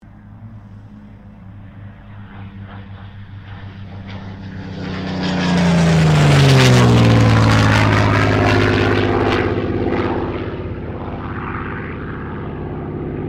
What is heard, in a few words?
AIRCRAFT SUPERMARINE SPITFIRE MK-XVI PASS; Airplane Sound Effects; Vehicles